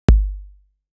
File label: bass drum unprocessed